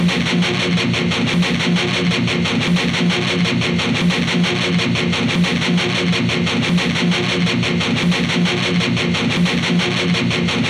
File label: Triaxis